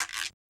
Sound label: Guiro Hit; 096 Amin